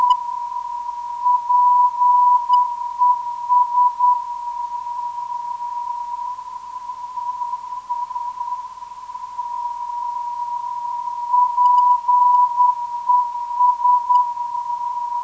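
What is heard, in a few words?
non-directional beacon; PES; 340.98kHz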